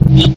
energy bounce, physcannon; Source Engine; video game; weapons

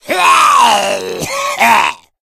smoker alert; source engine; left 4 dead 2; voice

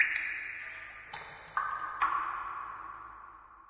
ambient water drips; video games; nature; source engine; portal2

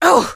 impact; episodic; episode 1; Source engine

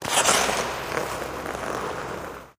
taunt skating scorcher stride

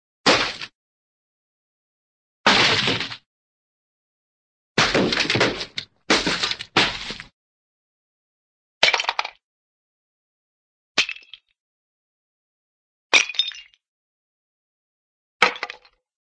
smash, melon smash watermelon; cartoon

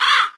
mystery beep; windows 9x; sound schemes

